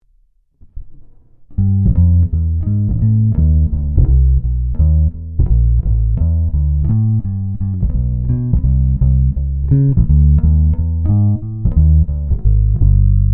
Ibanez EHB1505 Neck walking bass passive; tone50

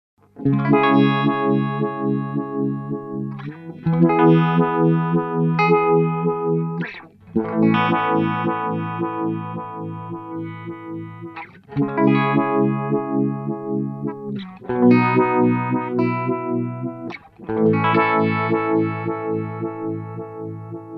Uni-Vibe Sound

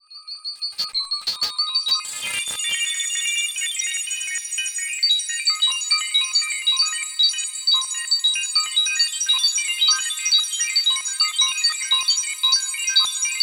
Bell Ringing Sound; Sonicware Texture Lab

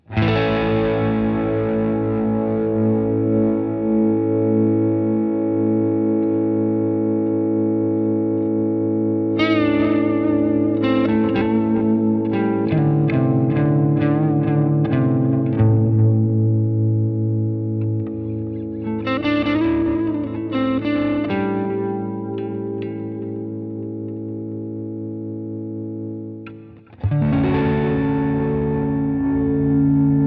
Headrush Sustain Pedal

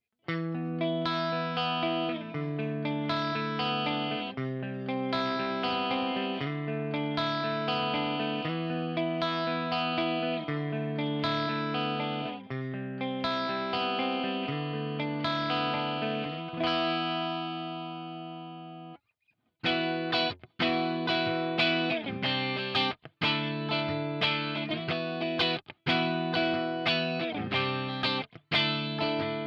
Jackson SL2 both CLEAN

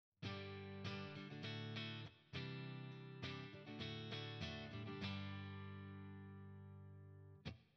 Boogie MK V; ToneX Pedal

